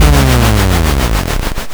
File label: monster defeat